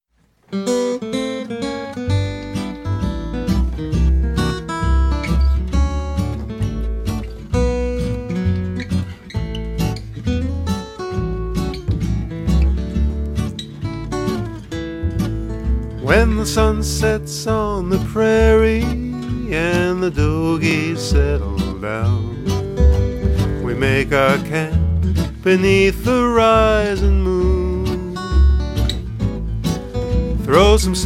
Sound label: Cowboy Lullaby